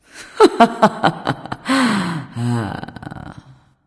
"heh heh"; smite; nike

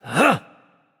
loud sound; smite; voicepack; osiris

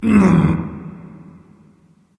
nv tongue; Smite; awilix

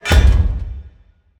obelisk pound; smite; Anhur

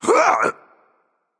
grunt attack, voicepack; smite; jorm

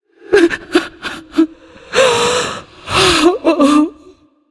boohoo; smite; nemesis

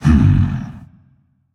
notice; smite; thor

